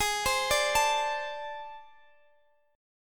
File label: A Minor Major 7th Double Flat 5th Ab